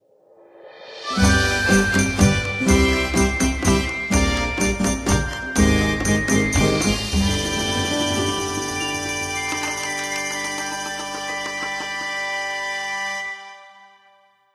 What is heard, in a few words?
Lvlup; Monster Hunter Frontier Z Zenith; PS Vita; PS3